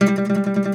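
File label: OUD tremolo, Plucked Strings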